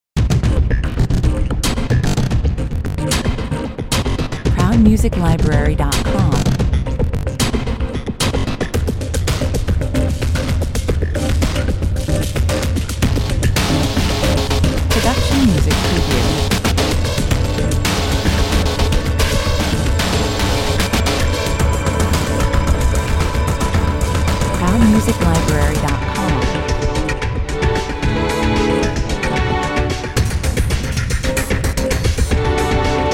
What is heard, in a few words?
commercial